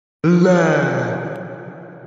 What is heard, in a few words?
seal roar